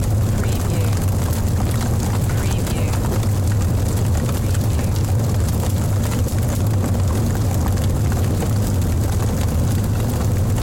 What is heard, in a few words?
Fire Blaze Intense